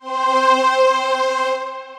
Full Layers, String Machines, Roland VP-330; Ableton; Factory Packs; Retro Synths

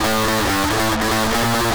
Garage Fuzz-Bass Am, Garage Bass; Dance DJ

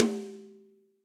Tom High Bright Room, Drum Hits; Standard Hits; Ableton; Factory Packs; Session Drums Studio

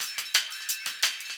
175 D&B Drums

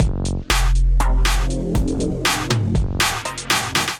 Kit, drums; Trancil Exit Kit; Ableton; Skitter and Step

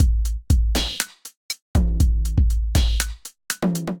Kit, Drums Hybrid; West Outer Kit; Ableton; Factory Packs; Drum Essentials

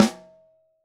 SN Top; Ableton Foundation; Snare Designer

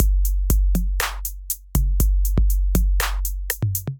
808 Kit, Drum Machines; 808 Aristocrat Kit; Ableton; Factory Packs; Drum Essentials

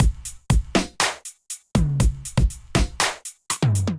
Kit, Drums Hybrid; Emperor Kit; Ableton; Factory Packs; Drum Essentials

